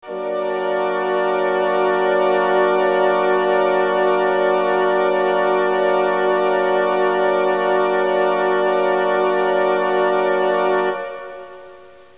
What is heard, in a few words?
piano intro